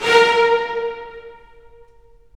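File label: STR, strings; Roland - LA Composer vol. 4; Full Short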